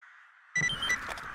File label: Beep, Alarmton/Buzzer-Dreiklang; passiven Mini-Piepser